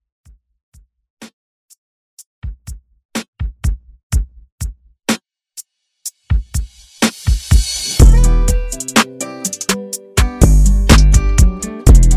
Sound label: Country